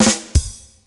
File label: rimshot; soundboard